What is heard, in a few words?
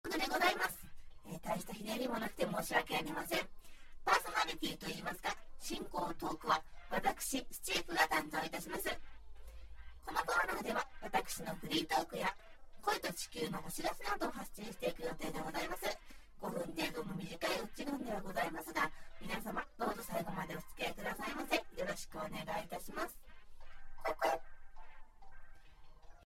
insect